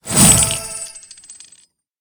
Massive Coins Gained Short; Angry Birds

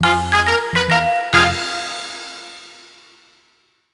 Game Death; penguins of madagascar the 2010 nds

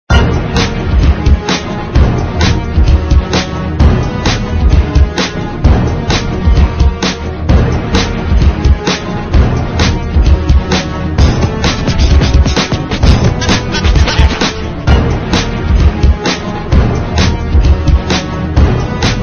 level music

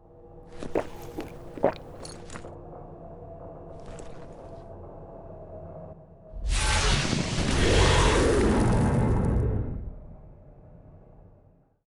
Demon Jar

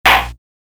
Gavel; Gyakuten Special CD

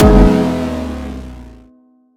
Retry Jingle; Friday Night Funkin' Friday Night Breedin' OST mod Windows gamerip 2022

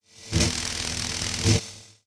sound Lightning Rods

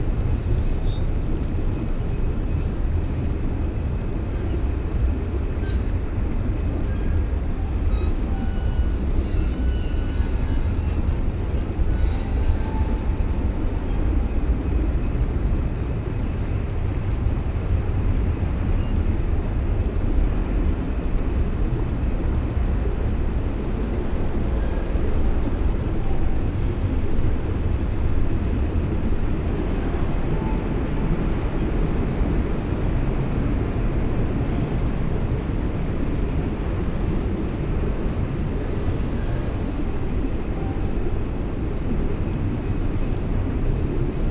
Ambient

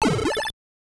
Medium enemy destroyed; Gradius; MSX

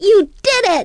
Correct

